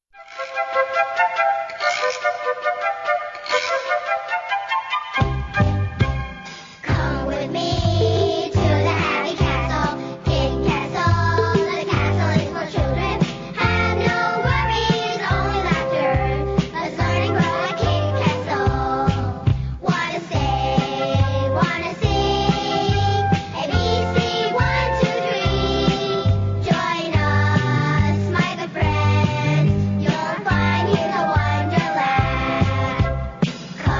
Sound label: Toddler song; Kid castle